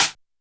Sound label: Hand Clap Synth; YAMAHA RM1X